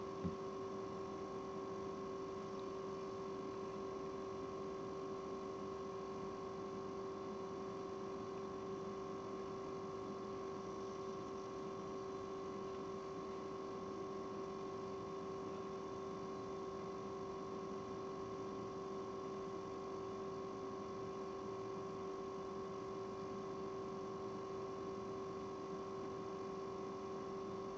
Disc Spinning

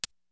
Tick

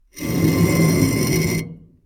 Drag A Brick

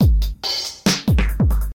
Heavy Wet

